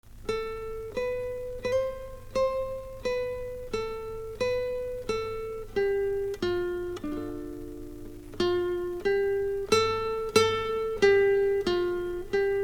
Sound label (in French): guitare; GOLDORAK; Goldorak CPC